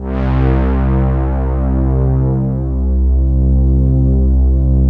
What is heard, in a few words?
OB-8 brass; Greytsounds VOL-1; OBXA